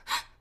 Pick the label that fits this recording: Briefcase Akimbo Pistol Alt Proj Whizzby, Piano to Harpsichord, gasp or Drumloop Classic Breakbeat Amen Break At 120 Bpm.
gasp